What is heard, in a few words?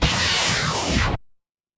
magic scifi laser